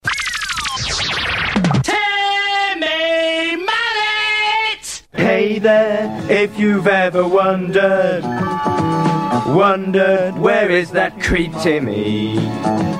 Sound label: jingle; timmy mallet